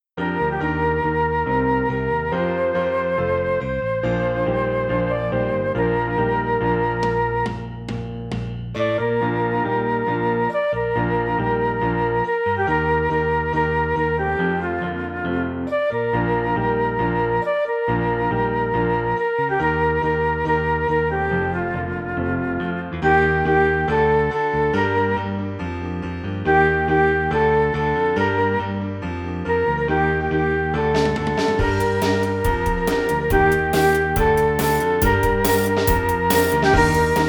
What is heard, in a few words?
Piano and Flute